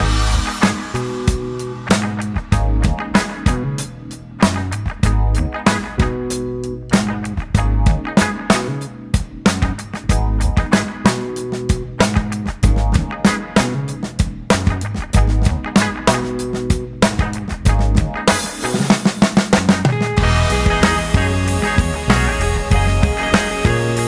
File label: Bass Idea